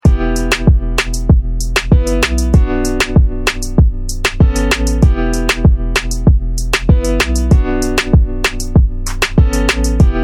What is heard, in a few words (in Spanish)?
instrumental